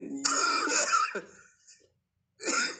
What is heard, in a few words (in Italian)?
tosse classica